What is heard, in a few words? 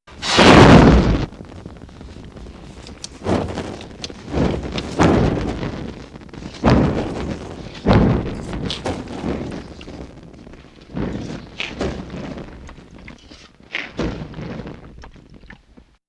Larger Chemical Flame Flares Up As Wind Blows On Fuel